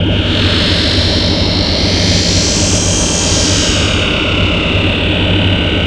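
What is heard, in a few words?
deflector shield; Star Wars Clone Wars; XBOX; Xbox Live Effects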